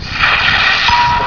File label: time ding